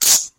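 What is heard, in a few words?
gum tape cut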